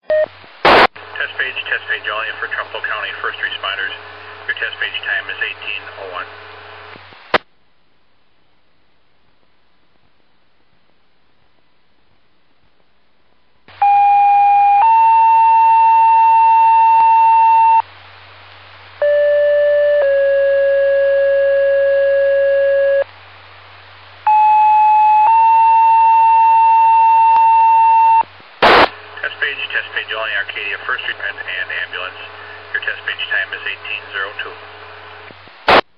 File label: page received